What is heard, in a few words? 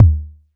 KICK, DRUMS; Music Store Sound Service; Einstein